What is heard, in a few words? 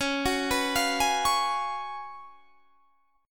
Gb Major 7th Suspended 2nd Suspended 4th